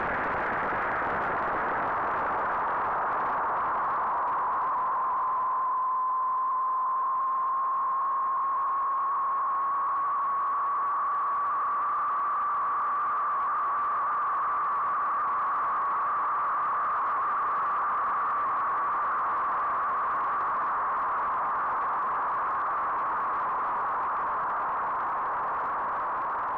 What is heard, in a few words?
Noise Band 1000hz